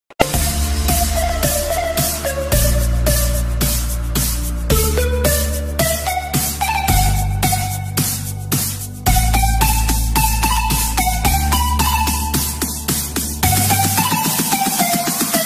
new bollywood independence day trap sare jahan se acha ringtone